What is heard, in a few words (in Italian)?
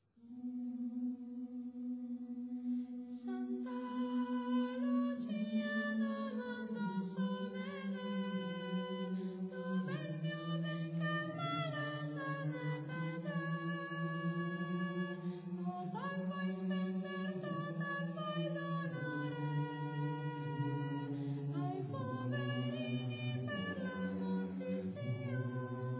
Santa Lucia, canto